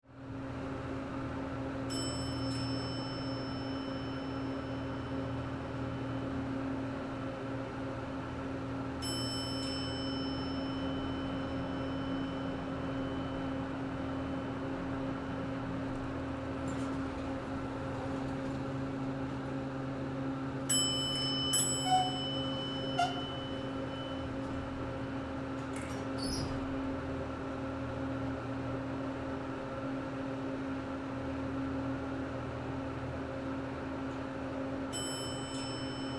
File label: Freight Elevator, Industry, Factory, Freight, Elevator, Ride, Sequence, Assembly, Plant; General, Motors, Gm; BlastWave